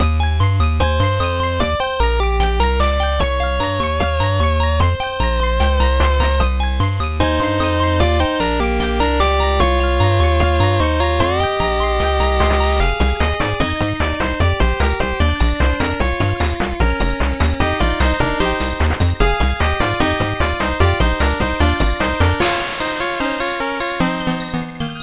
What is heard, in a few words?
8Bit; HGSS; Ice Path